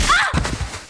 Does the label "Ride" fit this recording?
No